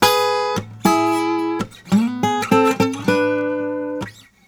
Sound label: Blues Guitar; ILIO; Fretworks; LICK